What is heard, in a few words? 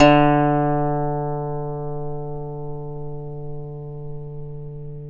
D2 DULCIMER; AKAI S6000 CD-ROM; WorldSounds